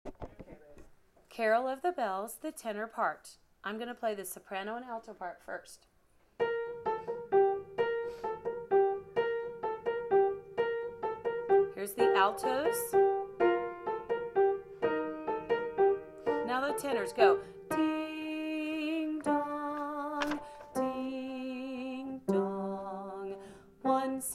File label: carol of the bells tenor